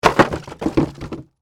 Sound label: Impact Wood Palette, Drop, On, Palette; Materials